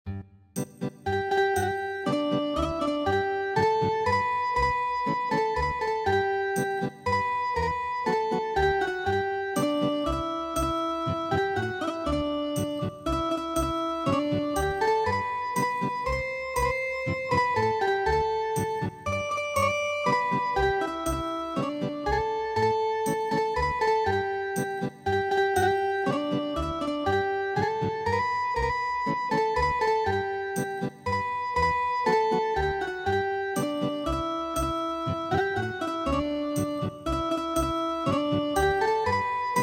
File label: Ethnic Guitar; four seasons rain